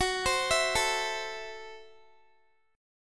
Gb Minor 7th Flat 5th Gb Guitar; Gbm7b5; arpeggiated; x,21,22,21,21,x

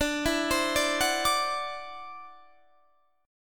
E 7th Suspended 2nd Sharp 5th D, chord

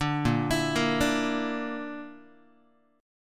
A sharp Major Flat 5th down Guitar; arpeggiated

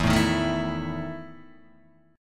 E Major 7th Suspended 4th E, Guitar, DADGAD; chord shape

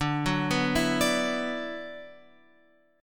D 6th Add 9th D, Guitar, Open F; D6add9 arpeggiated; x,9,6,6,7,9

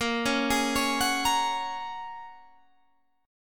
Bb Minor 7th Sharp 5th, Guitar, Open G

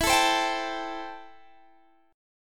A-Minor 7th E

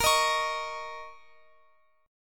Bb Minor Double Flat 5th Bb, Guitar, Open F; Bbmbb5 strummed

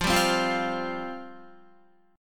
F Suspended 2nd F Guitar; Fsus2; strummed; 13,10,10,10,12,12

